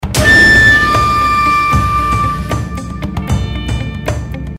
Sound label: flute; mgs3